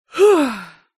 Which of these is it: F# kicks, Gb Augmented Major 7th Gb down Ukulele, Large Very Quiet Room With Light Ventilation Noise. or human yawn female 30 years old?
human yawn female 30 years old